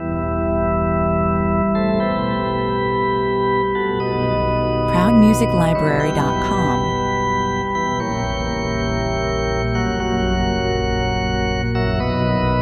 sinfony for organ 80 bpm

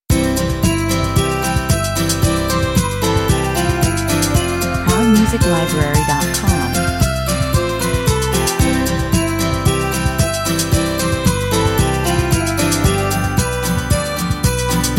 ringing bells 113 bpm

